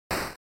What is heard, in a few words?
NES General Noise Single